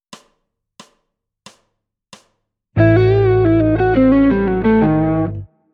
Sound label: blues